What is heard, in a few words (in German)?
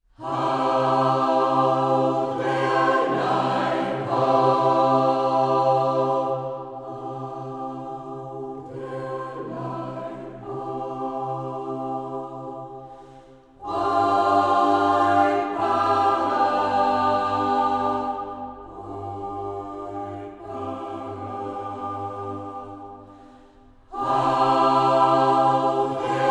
Jodler; Alperer